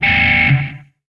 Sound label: Music Dist El Guitar